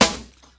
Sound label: shiny acoustic snare sample a sharp key, snare sound tuned to the A# note; snare drum samples